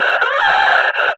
Death; Warframe; Lasrian; Battle Barks

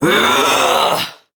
Throw, Barks; Kuva Lich; Persona C; Ability

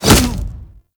Punch B; Warframe; Kuva Lich

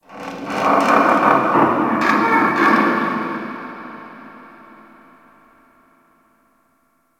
Infested Distant Metal Creak; Warframe; Corpus Infested; Ambience

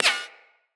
Bullet Impact Ricochet

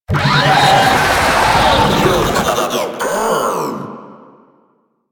Idle, Vocals; Warframe; Proto Infested; Babau

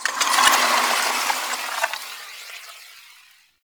Obelisk Stone Start Move

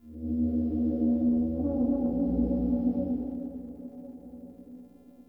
Interior Texture Large Moan, Infested, Ambience; Misc Indoor

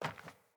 Sandals Walk Metal

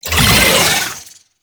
Lunge Cast Vocal; Warframe; Oraxia

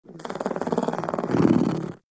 Kitten Growly Purr; Warframe; Catbrow

